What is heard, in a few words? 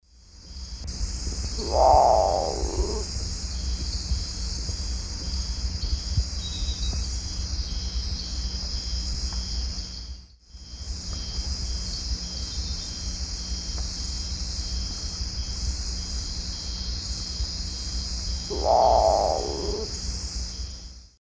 Nyctibius grandis, song; S. America; Eastern Lowlands